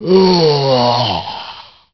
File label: zombie attack, male